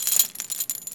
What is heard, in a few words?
chain movement; pudge